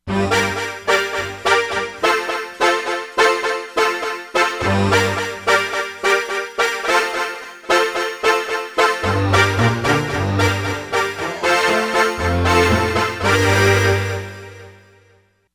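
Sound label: ethno brass, Korg; Korg MSC-13S Ethnic 2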